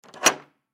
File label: Hotel Room Door Interior Unlock; Door Interior Dead Bolt Unlock; Foley Hotel Room Door Interior Dead Bolt Unlock; Miscellaneous Doors; Blastwave